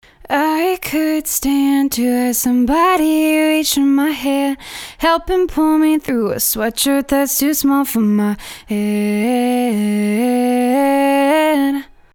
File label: dry vocal